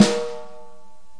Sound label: DW Map Sn, Real Kit; REAL LIVE KITS